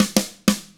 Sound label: hard rock drums; 96HARD ROCK